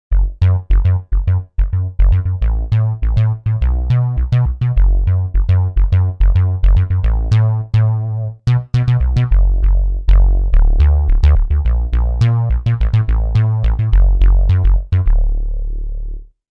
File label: Analog Bs 2, Roland JV-880; Factory Patches